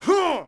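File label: Archer Attack; Sid Meier's Civilization 4 Complete; Warlords; Units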